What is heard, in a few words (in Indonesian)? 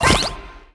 snowman kebentuk; MiniGames